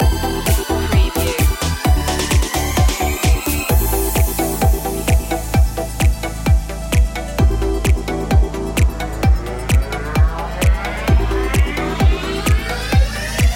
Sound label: Happy, feel-good electro pop track with upbeat vocals and synth; dance; club beats; no vocal loop end